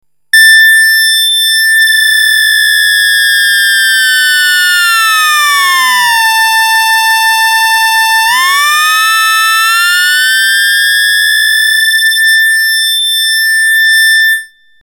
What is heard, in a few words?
up and down